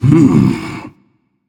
notice; smite; anhur

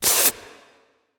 nv breath; smite; xbalanque

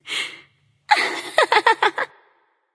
laugh; discordia

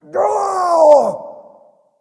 ouch; Smite; cabrakan

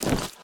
movement step; smite; god odin

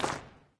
Foot Back; smite; god bakasura

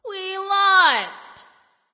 boohoo; freya

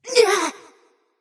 grunt hit; smite; voicepack; scylla

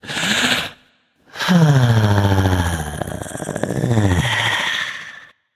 nv breath; smite; voicepack; ganesha

